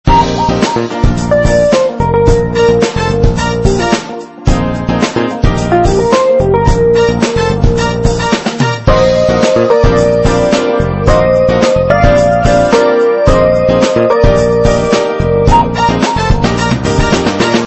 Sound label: Morning Fusion Style; LG CG225; ringtone